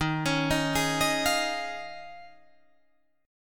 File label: D sharp 9th Suspended 4th D sharp, Guitar Drop D